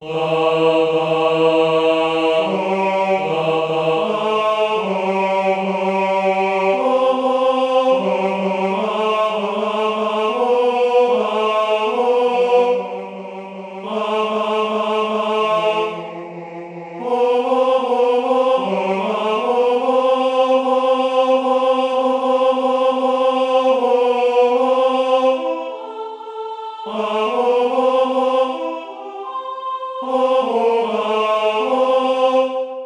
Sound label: Hopefully God Tenor